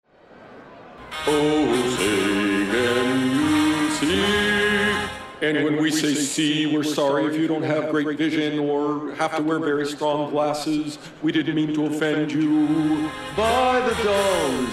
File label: Original Music